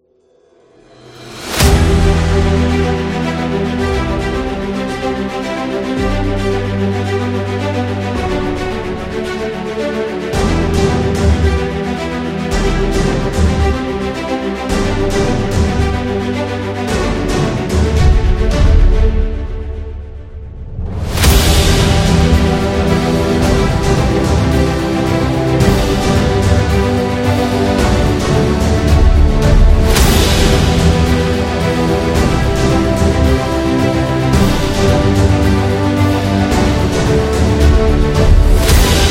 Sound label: Epic Music